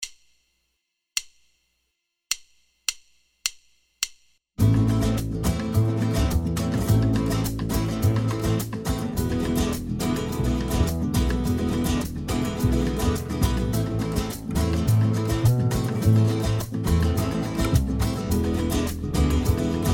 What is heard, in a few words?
ABC Song Flute backing